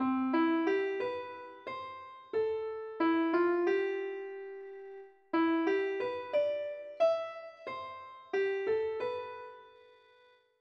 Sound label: - G natural